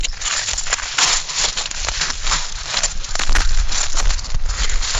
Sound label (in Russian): gribyi sobiraem shagi po lesu